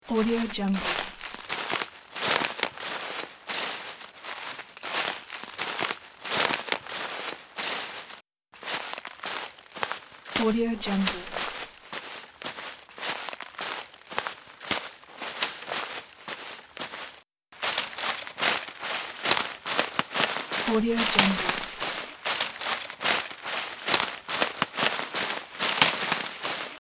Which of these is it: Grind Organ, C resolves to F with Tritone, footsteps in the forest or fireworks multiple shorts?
footsteps in the forest